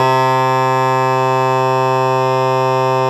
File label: BASS SHAWM; SoundBytes - Early Patches